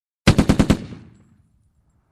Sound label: Heavy machine gun fire short